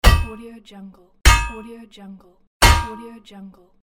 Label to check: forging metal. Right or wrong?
Right